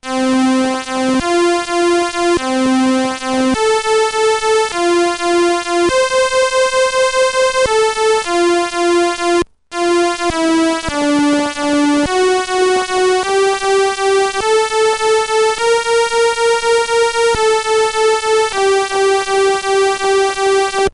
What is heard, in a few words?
apple2; celeste